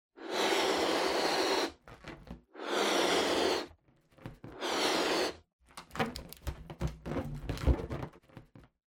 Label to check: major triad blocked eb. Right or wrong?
Wrong